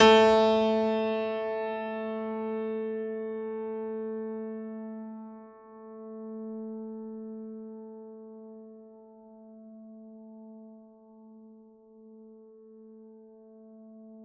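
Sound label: Classical Piano A3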